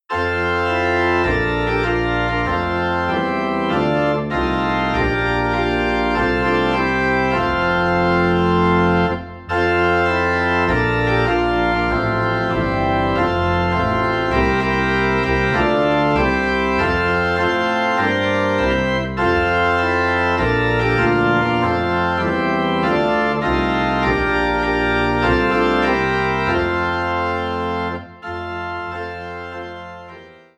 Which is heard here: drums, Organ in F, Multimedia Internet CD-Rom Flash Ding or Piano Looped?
Organ in F